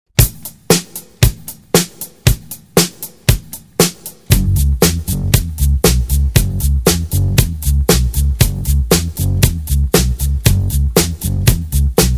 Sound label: disco